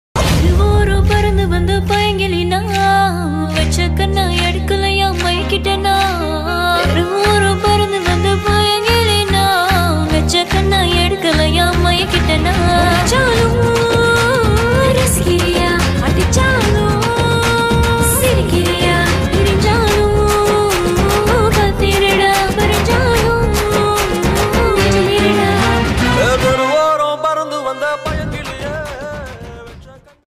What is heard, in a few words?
Tamil Song Ringtone